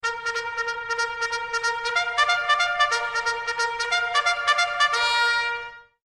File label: bugle call cavalry charge